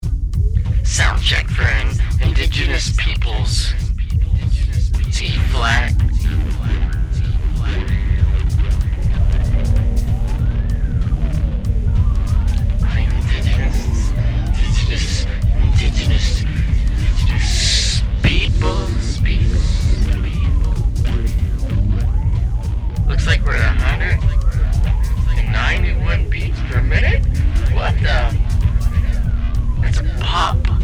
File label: Soundcheck